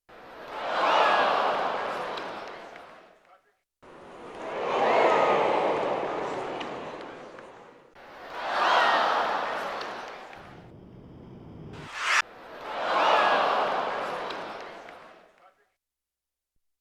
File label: crowd C4, Yamaha TX16W; Sound FX